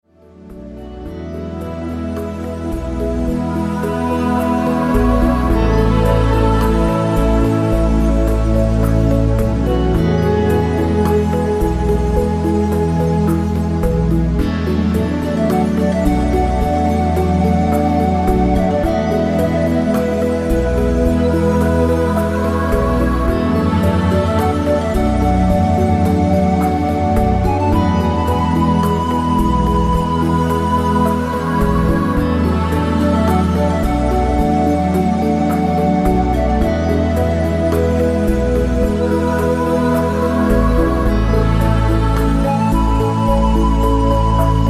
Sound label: Temple Of Meditation